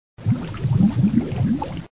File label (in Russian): puziri ot vodopada